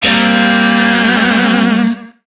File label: Shawzin Blues Chord K; Music; DE; Warframe; Lizzie Shawzin